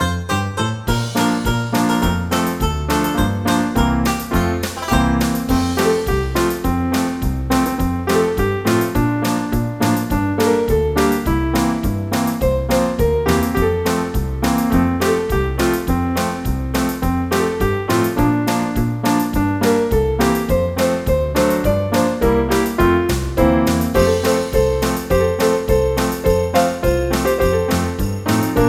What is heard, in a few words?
jingle